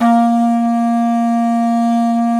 BF2 HORN R, Didgeridoo and Other Primitive Instruments; Big Fish Audio; DIDGEHORN1